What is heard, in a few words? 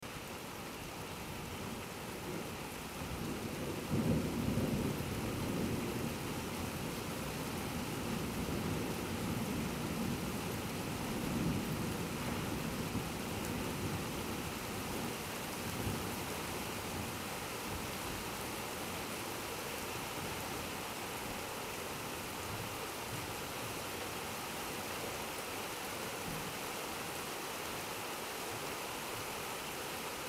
rain and thunder